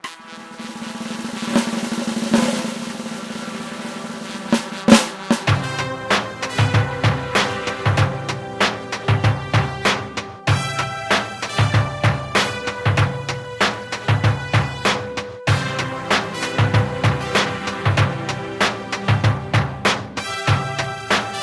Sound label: End Game Victory; Desert Thunder